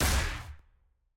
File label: aah; sett